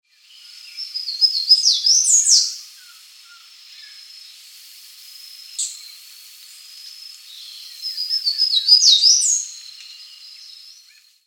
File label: Basileuterus culicivorus; Muxfeldt